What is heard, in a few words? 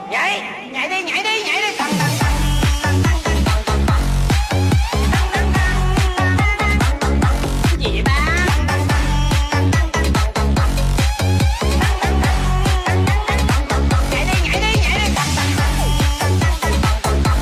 remix